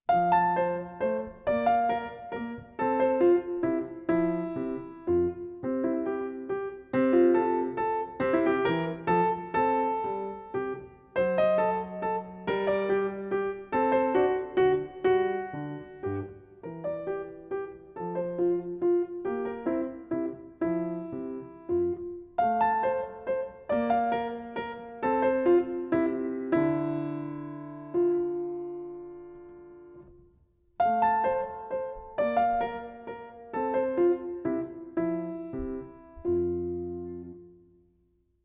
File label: Minuet in F Major; K2; Wolfgang Amadeus Mozart; Celebrate Theory recordings